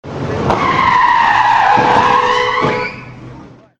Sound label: collide